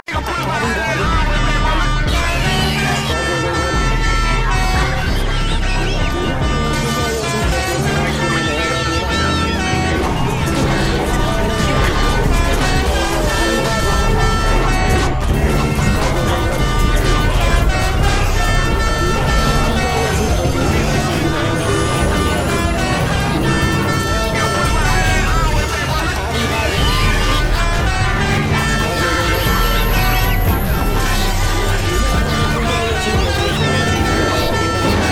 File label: Piano thing